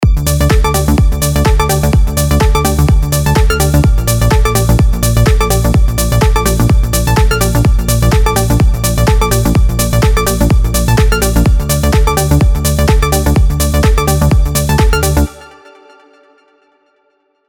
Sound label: Progressive Tech House Progressive Style; Nexus 3; Progressive Tech House 2